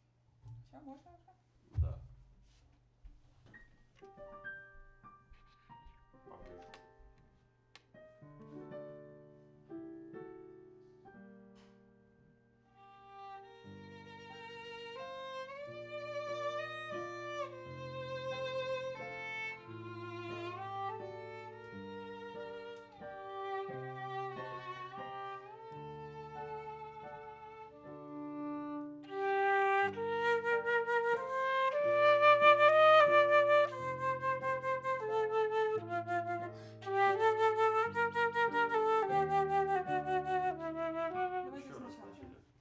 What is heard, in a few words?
Flute